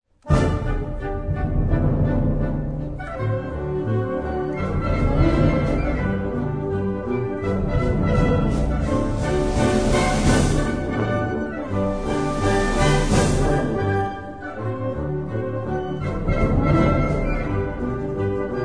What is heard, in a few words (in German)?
Unter Donner und Blitz